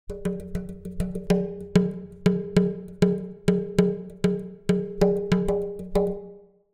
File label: Drums Exotic Percussion Beat; Hollywood Edge; sound designer's toolkit v2